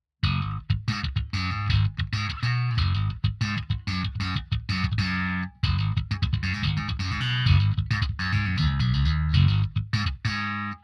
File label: bass mix